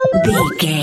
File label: ui menu popup message reward